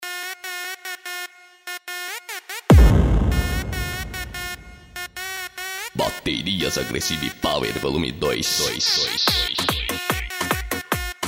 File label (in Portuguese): Baterias, som automotivo; Baterias Agressive Power Vol.02; som automotivo raiz; Open Format